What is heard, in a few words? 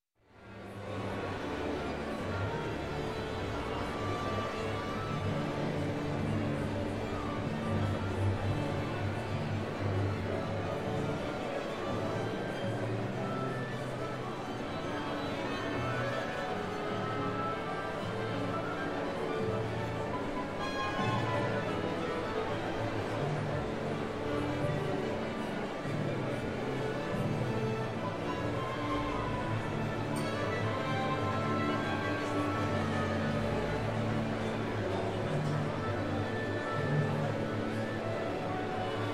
Orchestra tuning Up Before Playing, crowd; Ambience; Sound Ideas 2000 Series